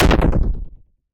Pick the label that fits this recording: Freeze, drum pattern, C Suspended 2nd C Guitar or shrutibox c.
Freeze